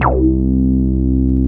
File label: BAS, Eurotech; Sounds Good